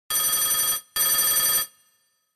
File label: Ring